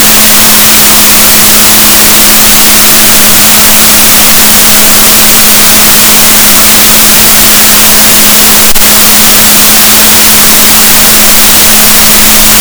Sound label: rorquals positif, Balaenoptera Physalus; gaussian noise; SNR -6dB